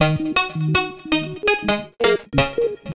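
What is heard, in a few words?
Lead Plucker, Drum & Bass Keys; Sonic Foundry (Sony Creative Software); Knutrix Drum & Bass; Keys Pads 1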